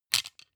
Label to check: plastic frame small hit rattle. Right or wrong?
Right